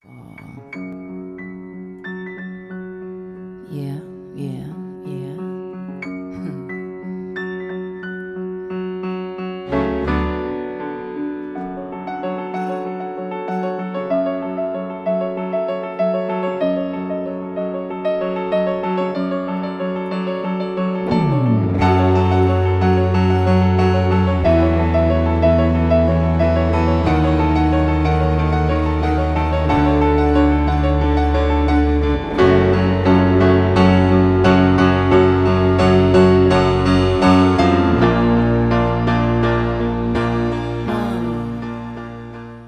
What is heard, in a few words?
Acoustic